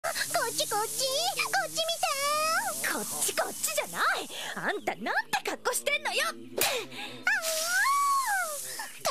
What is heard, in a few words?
howl